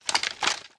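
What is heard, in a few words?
inspect movement; Valorant; stinger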